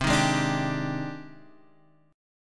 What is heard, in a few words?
Ab Major Sharp 11th C